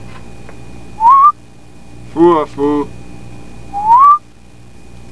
water

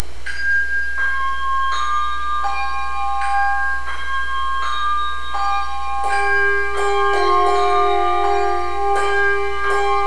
bells

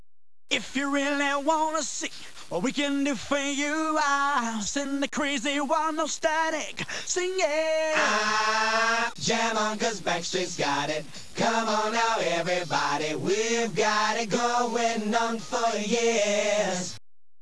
Acapella

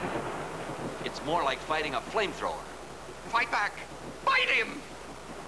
flame

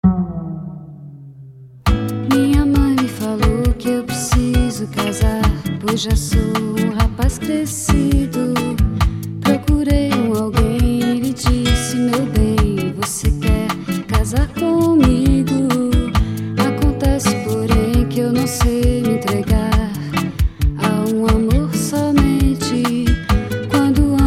beat

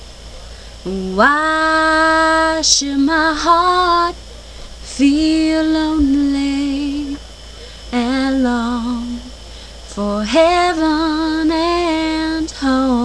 sparrow

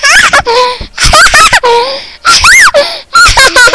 laugh

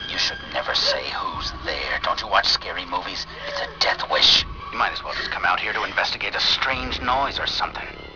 scream

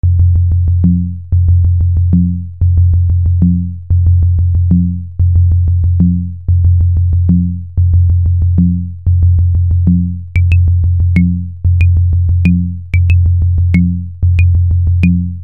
octave bump

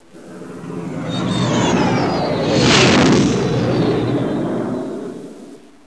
x-wing

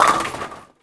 strike

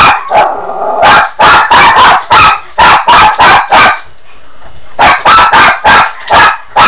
bailey bark

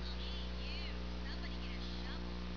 shovel

